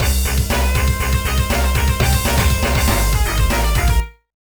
Power Chord; Microgame

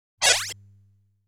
Sound of Player Mounting Dragon

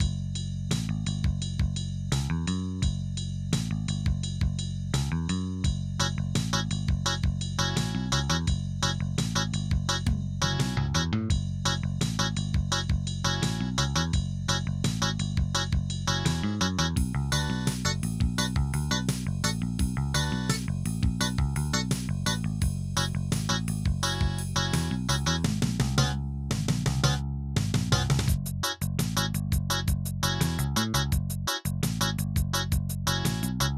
Shuffle, Roland; Blake Stone Aliens of Gold; MS-DOS

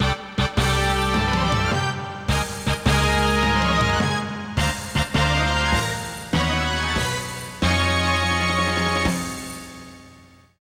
Fanfare Big

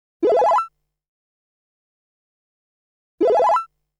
Quiz Correct Answer; string quartet

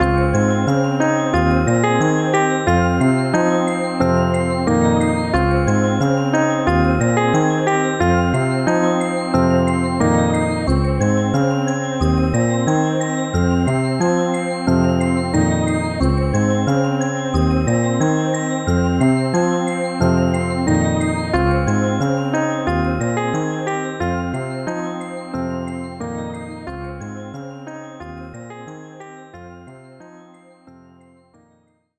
RPG Win

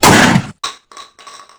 BENELLI M2

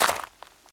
walk gravel

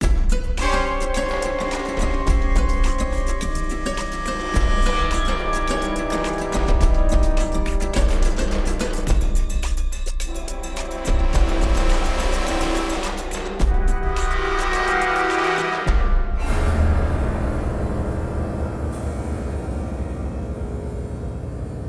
Zipline FLOURISH, soundtracks

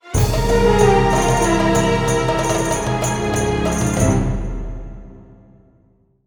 Win

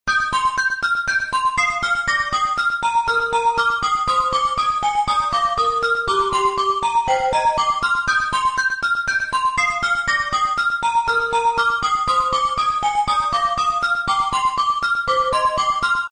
Menu Music

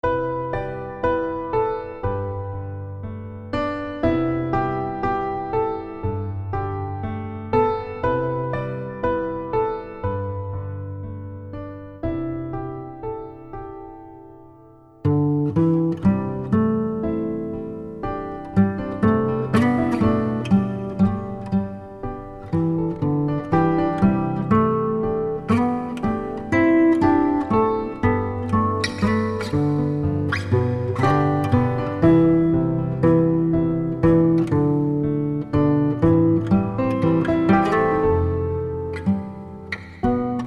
Acoustic; Nanatsuiro soundtracks; healing soundscape that encompasses you 2006; On The Hill Where Stars Fall

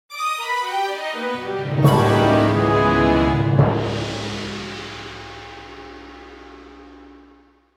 Jingle-Exit to Another Dungeon; The Nightmare of Druaga; Dungeon of Mystery

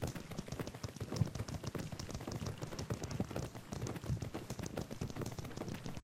walking; SFX

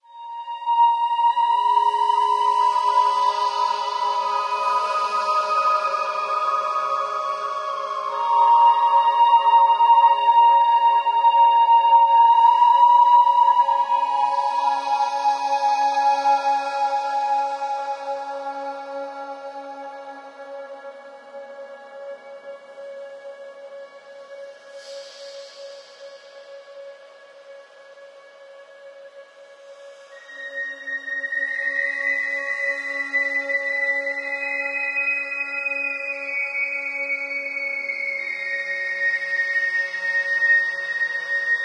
Village Sting; ether one redux gamerip 2015

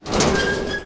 Safe Opened; Peace, Death

